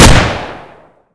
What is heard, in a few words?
beretta, weapons; brainbread